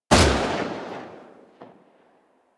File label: Burst Rifle Shot; Weapons; Grineer